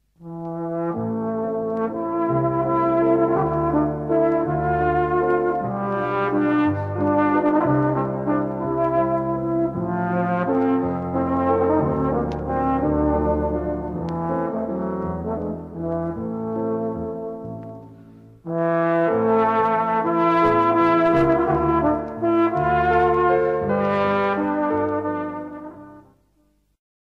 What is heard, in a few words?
Trombone fragment